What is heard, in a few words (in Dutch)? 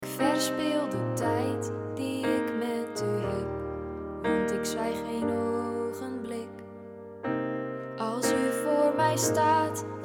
Schreeuwend publiek